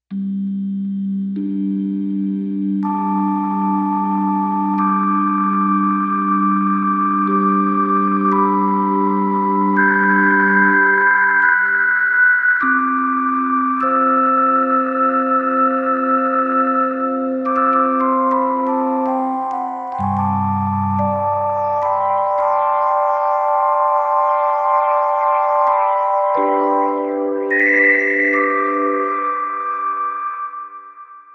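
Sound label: Organ RAIN TREE, synth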